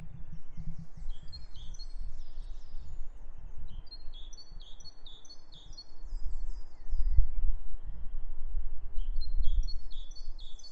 heading back to go indoors lovely birdsong especially trill towards end